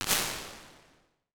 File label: Open Ambience; Medium Spaces; Apple